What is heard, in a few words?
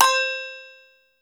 Br Bell, Yamaha Motif ES drum machine; 300 Drum Machines; Yamaha Motif ES-Series